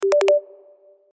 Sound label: alcatel code ringtone